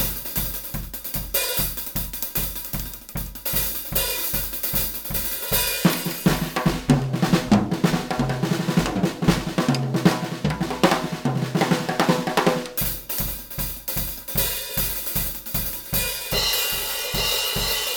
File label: double beat